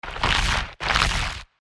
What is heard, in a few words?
sticky movement